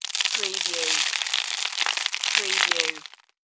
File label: Debris Rock Salt Car; Sound Effects Bible